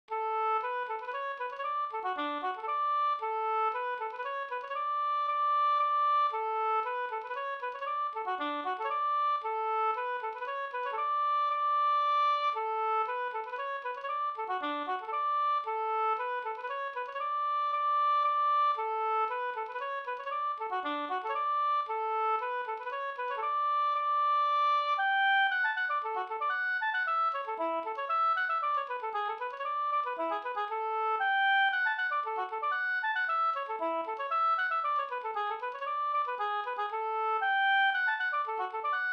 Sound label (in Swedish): polska Bingsjopolska; Kalkbacken e Pekkos Helmer